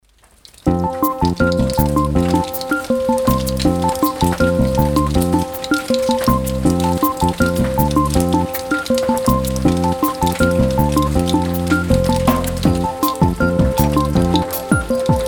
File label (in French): VERSION KARAOKE